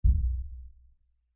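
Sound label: shark sounds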